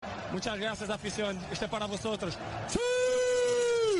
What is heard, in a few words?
Siuuu Notification; Cristiano Ronaldo